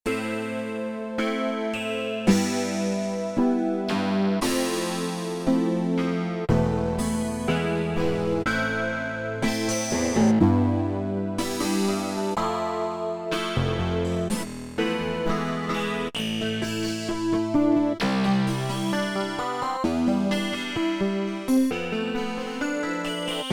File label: Wave Pluckin, Korg Radias-R